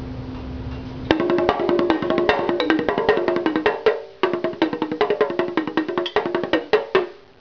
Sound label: flower pot drums